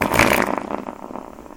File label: fart medium